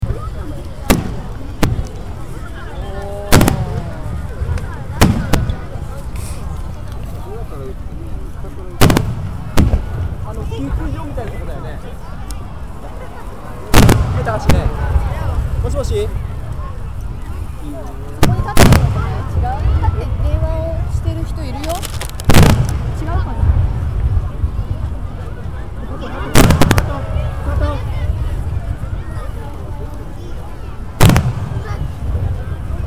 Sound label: fireworks